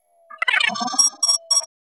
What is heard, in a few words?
Glitch